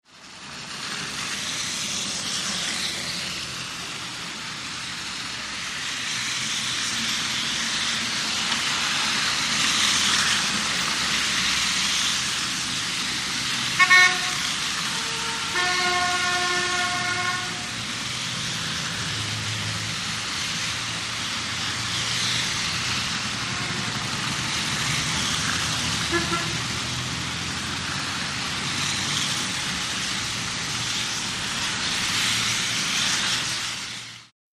City traffic in slush with horns.; TRAFFIC WET TRAFFIC: EXT B/G

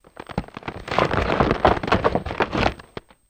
Ice Groan Clunk

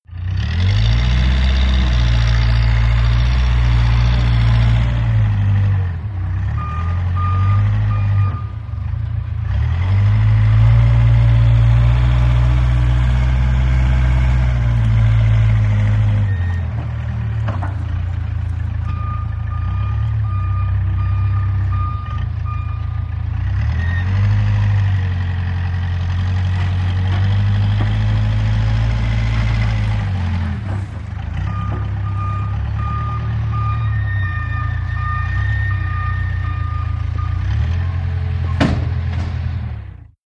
750 Dozer Back Forth, john deere 750

